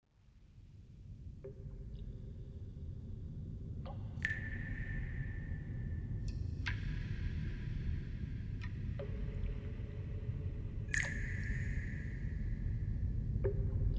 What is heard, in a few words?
dripping; Minecraft; liminal-industries